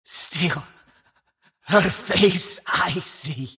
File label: death; skywrath mage